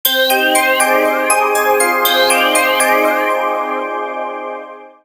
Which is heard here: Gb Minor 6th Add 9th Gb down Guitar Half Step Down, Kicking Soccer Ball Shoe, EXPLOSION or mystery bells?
mystery bells